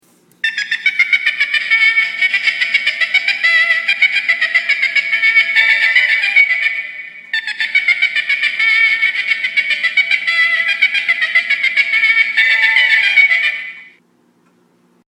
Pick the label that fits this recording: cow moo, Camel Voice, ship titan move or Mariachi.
Mariachi